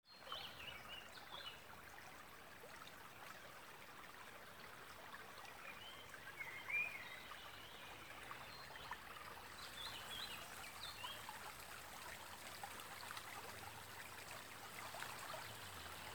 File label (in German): Fluss